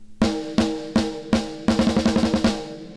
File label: snare; Squeezed like lemon